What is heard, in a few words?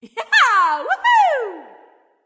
"yay"; smite; nuwa